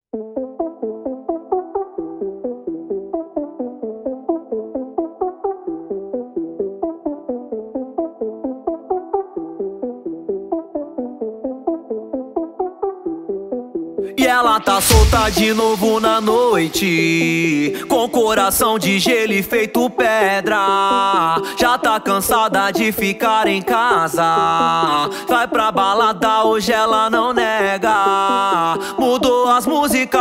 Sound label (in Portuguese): explode funk